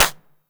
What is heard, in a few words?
vermona drm1 mk3 clap